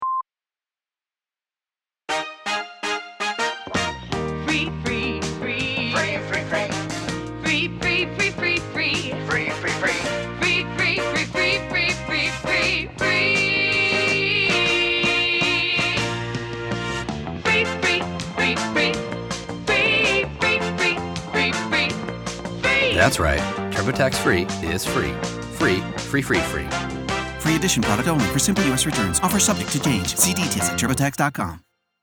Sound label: radio spot, classic American jingles; Singing Serpent; turbotax; turbotax free radio campaign